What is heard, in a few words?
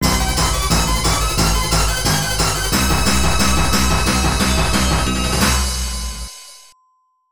Victory Fanfare